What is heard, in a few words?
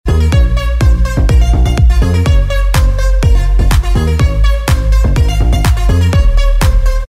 Inst